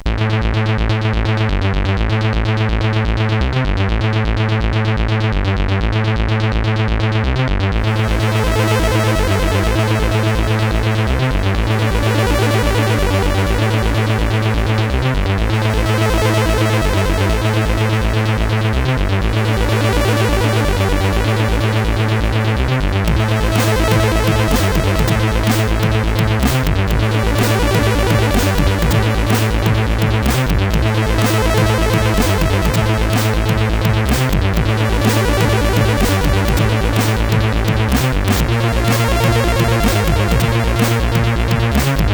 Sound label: Intro sid CSG8580R5